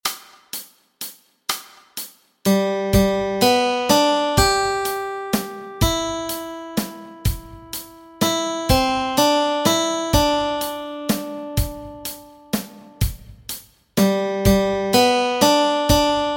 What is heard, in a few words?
melody